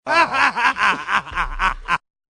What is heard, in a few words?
laugh